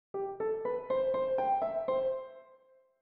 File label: reverb